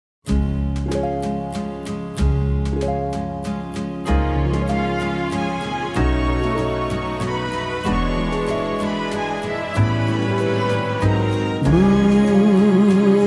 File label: singing